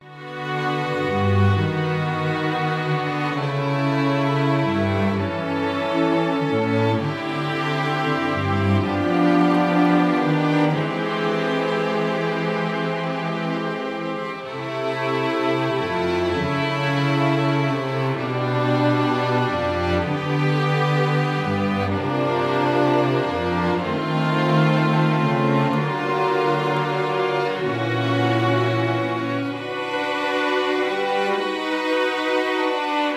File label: sketches for bass orchestra